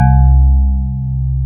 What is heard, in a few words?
PIA DX E-PIANOS